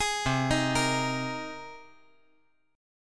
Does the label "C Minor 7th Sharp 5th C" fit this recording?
Yes